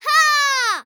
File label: EMOTE Child, Anger Fight Grunt Big